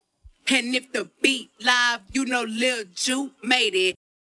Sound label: producer tag